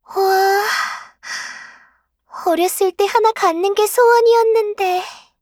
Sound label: item Music Box; Voice Line; Eternal Return; Garnet